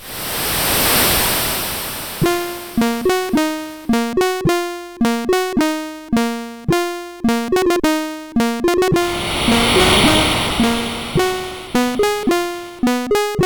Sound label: Carillon, sid CSG8580R5